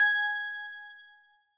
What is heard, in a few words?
bell